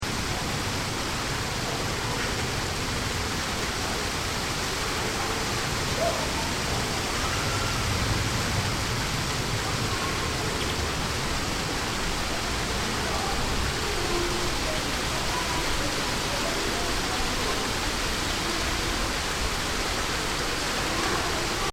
Summer Rain With Children And Birds In The Background; CraftedSignals